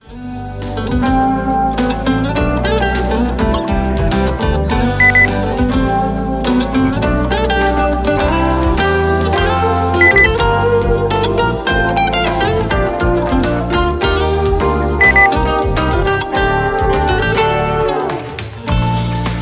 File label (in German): gute laune